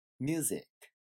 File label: music